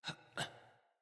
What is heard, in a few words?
Dismount, Barks; Battle; Envoy